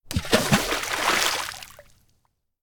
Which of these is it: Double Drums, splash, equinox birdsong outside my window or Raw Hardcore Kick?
splash